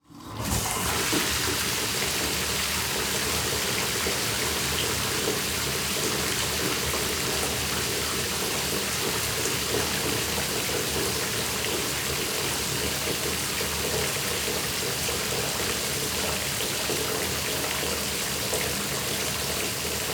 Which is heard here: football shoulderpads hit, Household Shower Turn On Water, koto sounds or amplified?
Household Shower Turn On Water